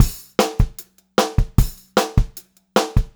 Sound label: POP BT, Pop-Rock Drumloops; 152 POP